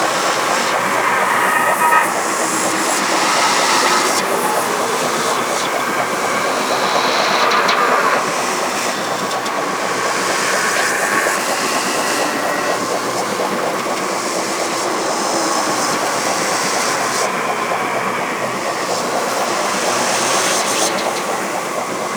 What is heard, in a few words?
Orb Loop; Warframe; Zariman; Survival